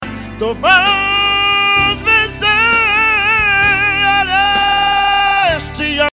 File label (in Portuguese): cantor gospel exaltado o mestre das firulas vocais